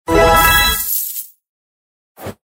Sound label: win sound